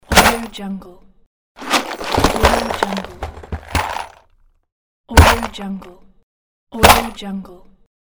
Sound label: screw box